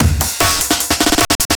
Dubstep Fill, 150bpm; Drum Fills; Ghosthack; Dubstep Sound Arsenal

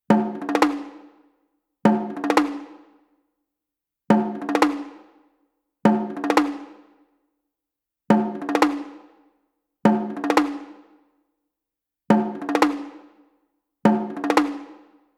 Toms - 120BPM; Percussion Kits; STEMS; Ghosthack; SkyRealm; Kit 01 - Death March

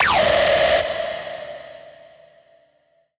Techno Synth Shot D#; Synth One-Shots; Ghosthack; 45 Free Techno Synth Shots 2025

